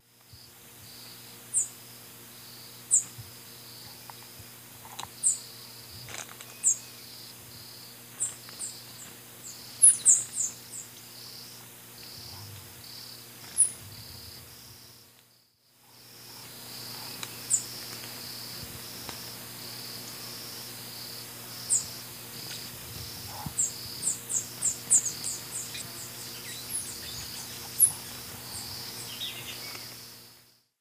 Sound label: Dacnis cayana, song and calls; S. America; Eastern Lowlands